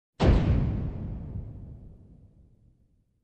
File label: Steel Door close slam